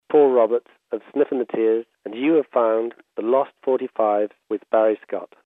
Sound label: Sniff